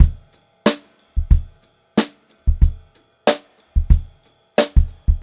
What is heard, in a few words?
Funk-Groove Drumloops; USB Soundscan vol.38; AKAI; 90 s Sample CDs